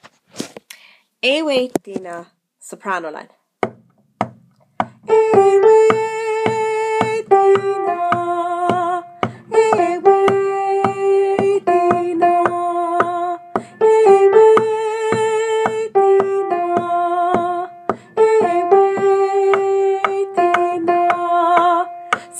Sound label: Soprano